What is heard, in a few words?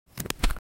sound to switch back in, confirmation